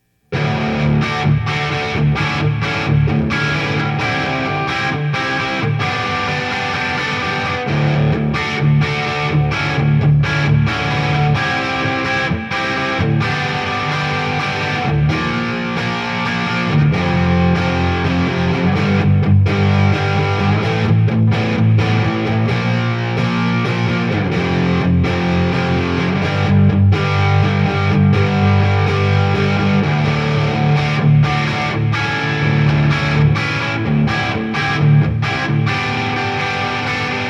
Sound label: Low Gain; EMMA Reezafratzitz